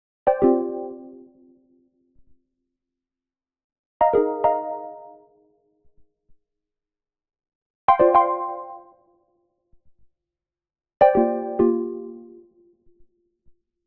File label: Arcade Jingle Neutral; boom library; The Interface